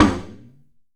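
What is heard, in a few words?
FX TALKING DRUM, PERCUSSION; AKAI S6000 CD-ROM - Volume 5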